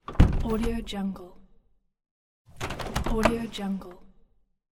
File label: plastic window